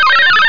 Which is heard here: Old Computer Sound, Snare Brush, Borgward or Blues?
Old Computer Sound